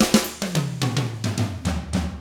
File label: 110 SAMBA, Drums; ILIO; Double Platinum Drums 1